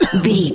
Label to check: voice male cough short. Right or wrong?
Right